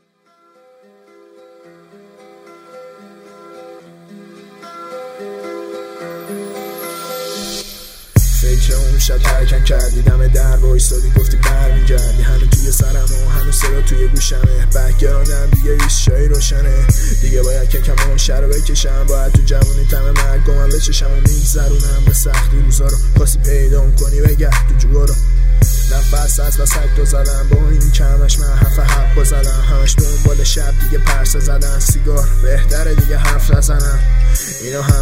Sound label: Background